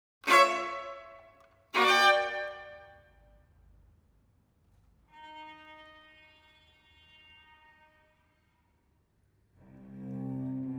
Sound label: string quartet